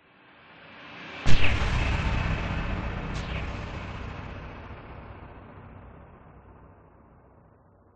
cinematic reversed build hit sharp powerful long tail; sound design